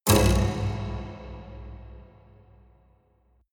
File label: haunted strings buzz